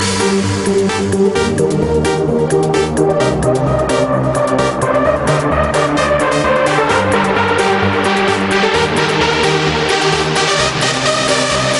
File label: russian trance